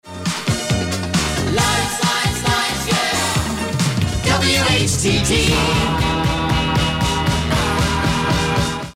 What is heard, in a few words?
jingle; whtt